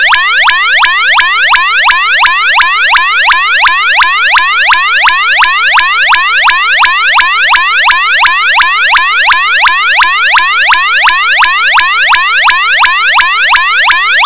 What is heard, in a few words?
special warning